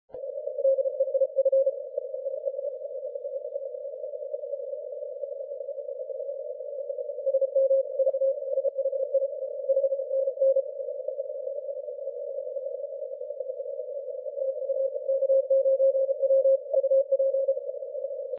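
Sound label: 40m continuous wave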